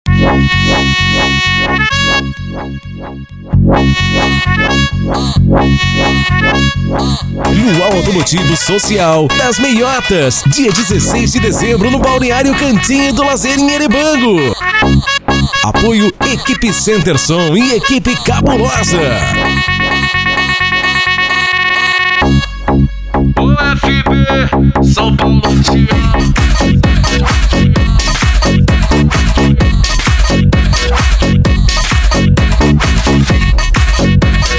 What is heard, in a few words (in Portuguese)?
luau automotivo